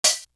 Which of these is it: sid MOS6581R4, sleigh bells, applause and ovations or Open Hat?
Open Hat